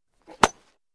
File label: Baseball Bat Alumin; baseball fx & games